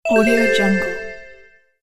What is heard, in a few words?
login